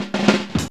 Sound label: fill 108; Zero-G; Funky Elements